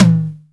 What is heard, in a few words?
Tom Bongy, 909 Toms; Slate; Vintage Drum Machines Volume 3 909 Edition